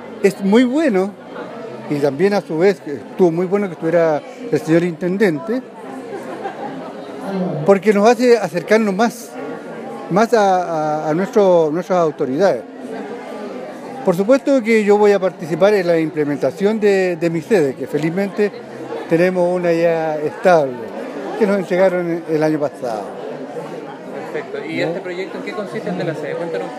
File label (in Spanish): canto